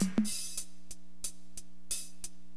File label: HH LOOP, Hip Hop, Drums; LiveAct Pool 1